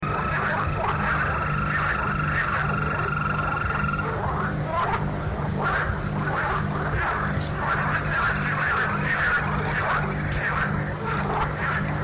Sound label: bufo hylarana